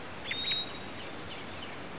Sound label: BIRD; animal